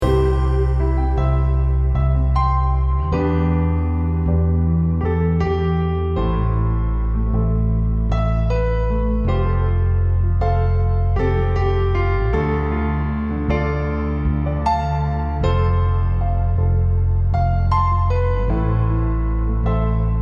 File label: Healing Melody